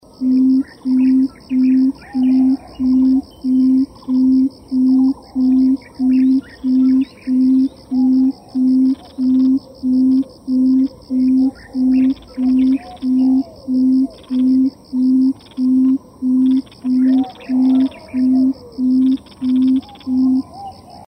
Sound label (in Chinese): 棕三趾鶉; 三趾鶉科/留鳥